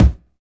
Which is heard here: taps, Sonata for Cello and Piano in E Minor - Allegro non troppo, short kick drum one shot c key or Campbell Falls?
short kick drum one shot c key